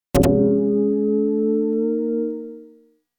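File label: electronic bow hammer; music electronic; Asian Show Sound Effects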